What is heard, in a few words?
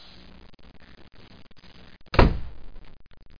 DOOR SLAM; Broderbund Print Shop Multimedia Organizer Deluxe Edition